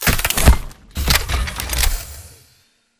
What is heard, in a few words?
stand reload; Titanfall 2